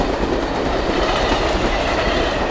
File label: subway loop